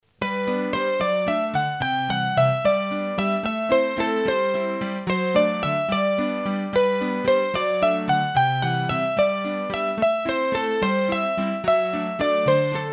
hurdy-gurdy, Valses; L'Urosa Jardiniera; Massif Central

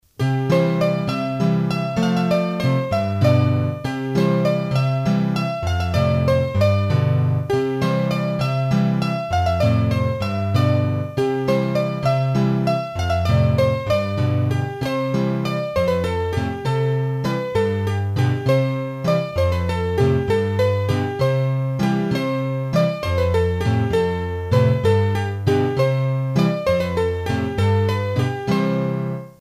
Lo Codretto, hurdy gurdy, Bourees d'Auvergne

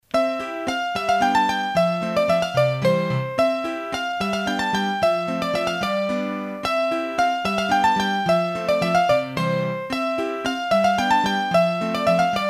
Bourree, hurdy-gurdy, Auvergne; Massif Central; Bourees d'Auvergne

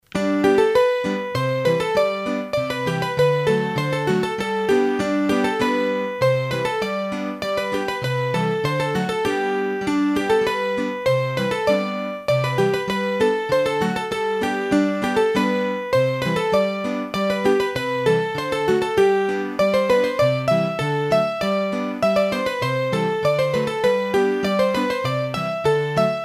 Scottische Nerondaise, hurdy-gurdy; Massif Central

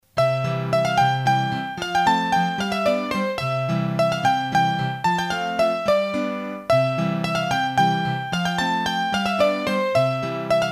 Bourree, hurdy-gurdy, Auvergne; Massif Central; Bourees d'Auvergne

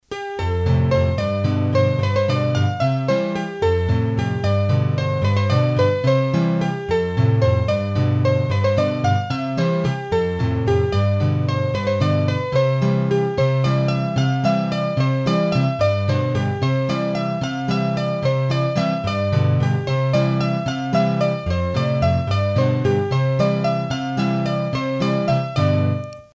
Bourree, hurdy-gurdy, Auvergne

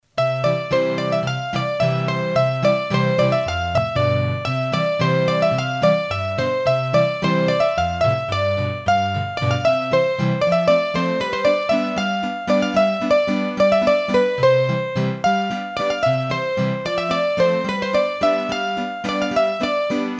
Scottische Valse, hurdy gurdy; Massif Central

